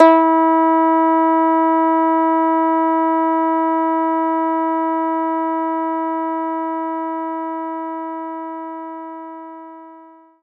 Finger Bass D1; knights try

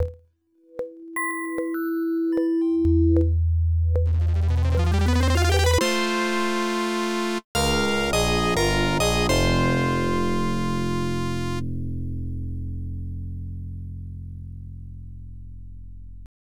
Fanfare; SystemSoft Fanfare; columns taisen mode tsuki pc 98 gamerip 1991

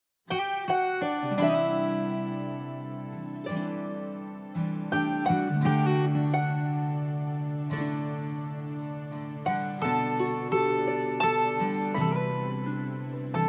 Instrumental Version; karigurashi no arrietty

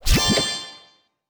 reveal rarity; Animation Throwdown; cardbabies

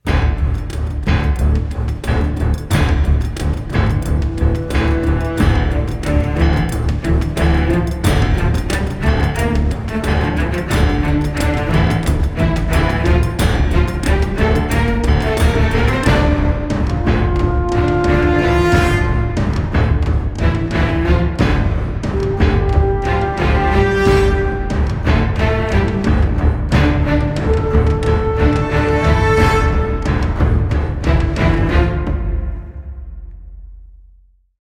Motivational Orch; Crysis

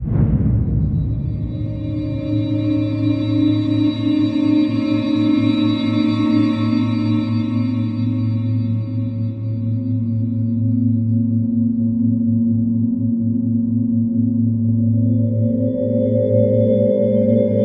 Dark soundscape; Empyrion Galactic Survival

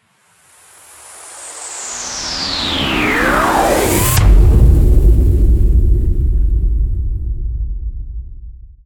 Transition

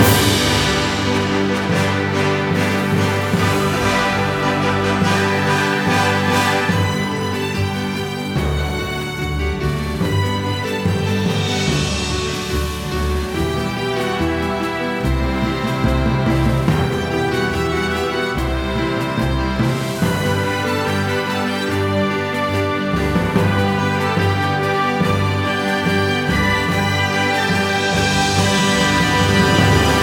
Level Up Victory; child of light

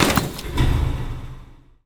Loop Crane Pickup

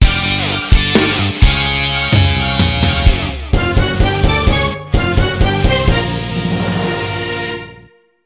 mahjong jingle

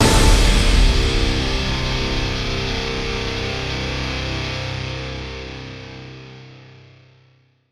FAST GTR; transformers war for cybertron gamerip

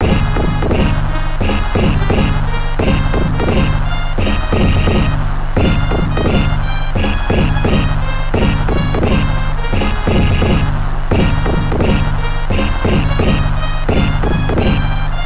Crowd Noise; MLBPA Sports Talk Baseball; genesis

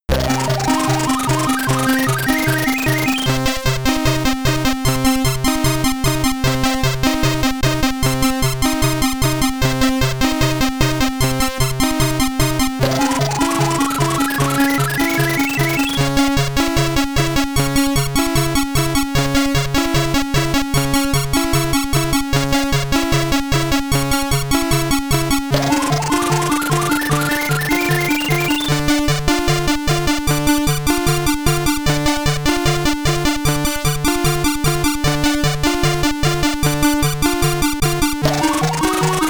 Boss Theme (SID Stereo); Xenon; Commodore 64

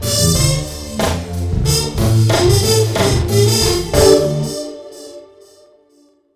Jingle Echo; Puzzle3d Miles Style

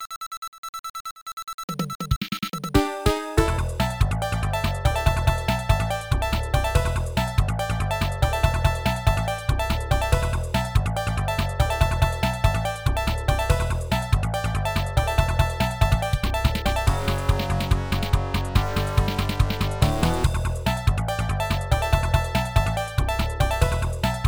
Stage Clear BGM; Burning Dragon Plus; PC-9801; OPNA

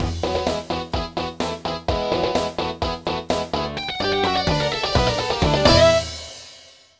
GUS, Fanfare; Raptor: Call of the Shadows; MS-DOS

